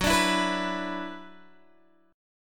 Gb 7th Suspended 4th Sharp 5th Gb Guitar Open G

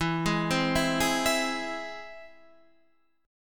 E Augmented, Guitar, Standard; E+ arpeggiated; JGuitar

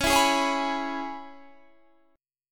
C sharp Minor 7th C sharp Guitar Standard; C#m7; strummed; JGuitar

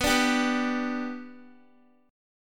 B-Minor Sharp 5th, Guitar; strummed

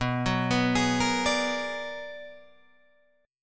B-Minor Major 13th B down Guitar DADGAD; arpeggiated

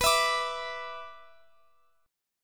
B-Minor Double Flat 5th, Guitar Open F; Bmbb5; strummed; x,x,x,18,19,21

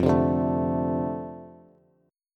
F 6th F down Bass; F6 strummed

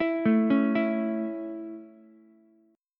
C sharp Minor Sharp 5th down Ukulele; C#m#5; arpeggiated; JGuitar

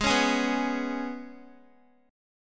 G sharp Minor Double Flat 5th, Guitar; strummed; x,11,13,13,11,x; 4F,4A,5C,5G,5C,6E; G#mbb5

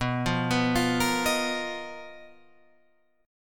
B Major 7th Flat 5th B down, chord shape

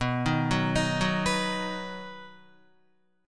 B Major, Guitar, 4F,4A,5C,5G,5C,6E; arpeggiated; 6,6,6,8,6,7; JGuitar

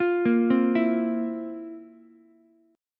F Major 7th Suspended 4th F, Ukulele; FM7sus4 arpeggiated; JGuitar

